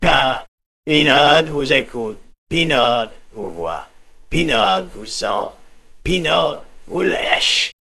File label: jingle